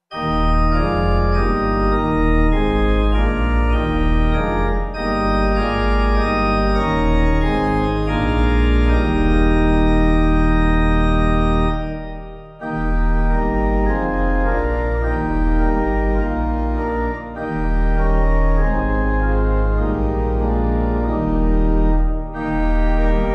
Saviour Breathe Vesper Hymn Pipe

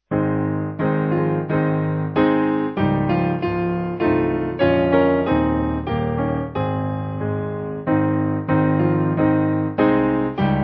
My Faith Has Found, Piano; Simple Piano

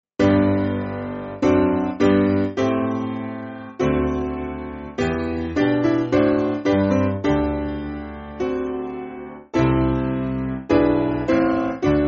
Lammas, Piano; Draw Nigh

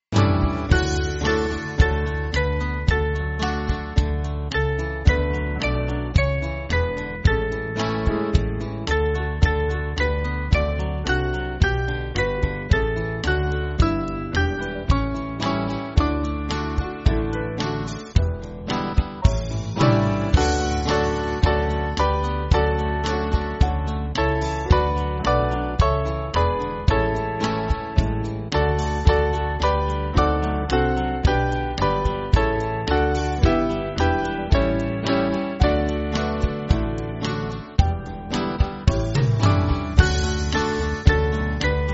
Band, church music

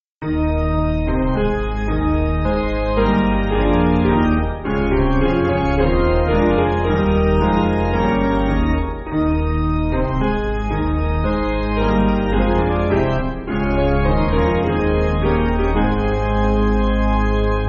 Hymnary Piano

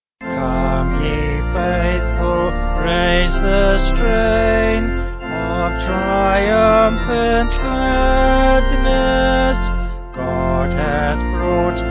Vocal Organ; St John D

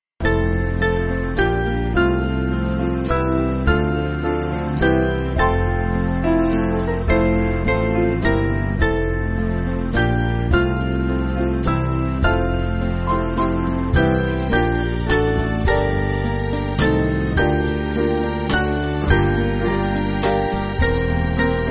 Band, church music; O Thou To Whose; Grace Church

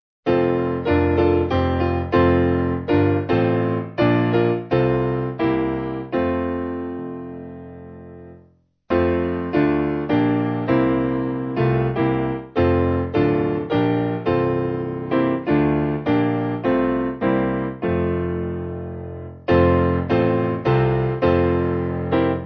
Intro Piano; My Country; America

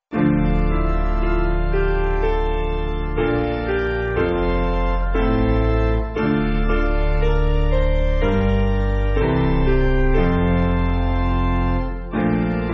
Piano; Picardy

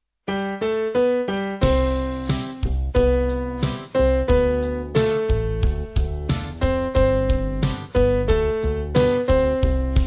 Band

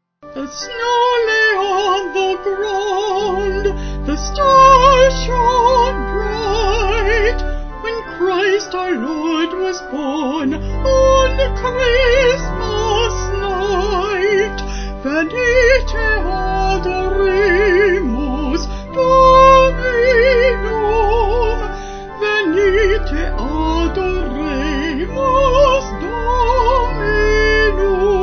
The Snow Lay Venite Adoremus Vocal Organ

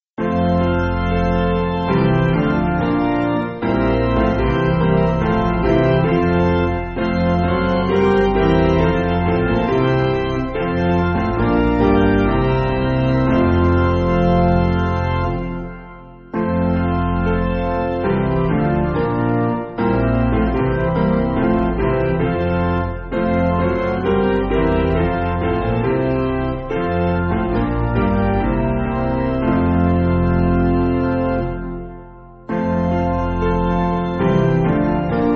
Piano

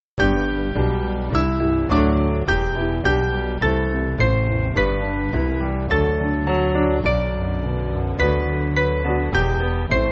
Piano, church music

